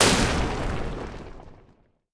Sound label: Explosion Medium Smash Debris Stereo